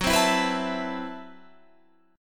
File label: A Minor 6th Add 9th Gb, Chord